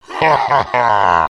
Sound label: monoculus laugh; npc